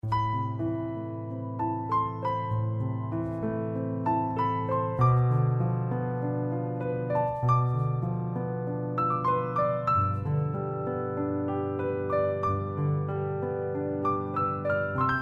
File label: piano thing i did